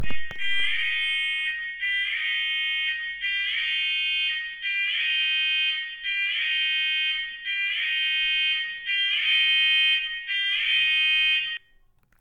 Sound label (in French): Alarme PPMS; GEAUNE